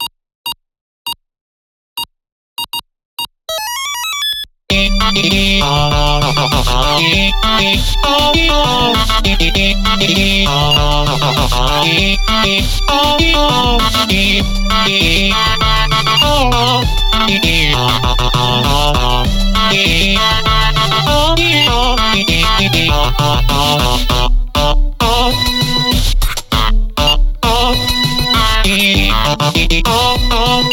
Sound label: Parachute Modern With SFX